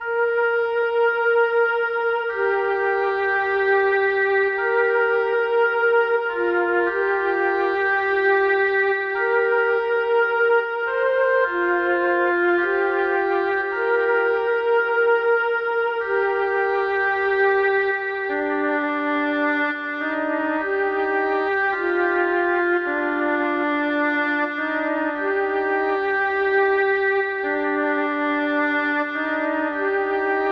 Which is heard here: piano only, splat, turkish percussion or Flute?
Flute